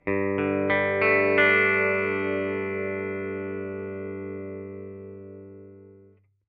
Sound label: clean amp